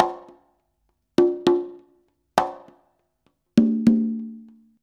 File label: CONGA, Modern Percussion Loops